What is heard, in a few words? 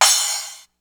Dance Crash, Ensoniq ASR-X Pro